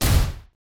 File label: blow, battle